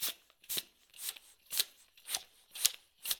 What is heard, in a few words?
airpump; best service Blue Box; mixed sounds short